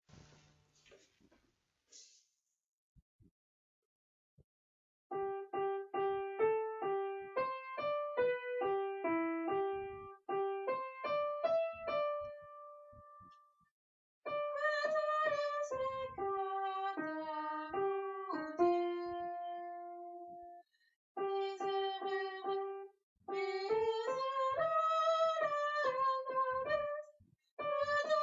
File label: gloria sop